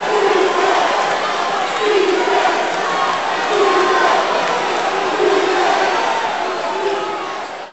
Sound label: BBall Crowd Defense; Crowds